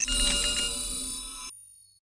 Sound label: aim update; StarRiver-EndlessJourney-RW-MODS; Old Sun God